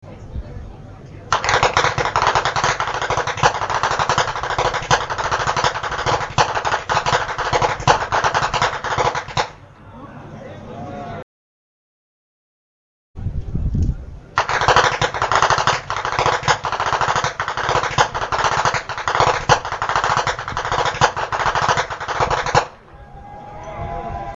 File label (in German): schnalzen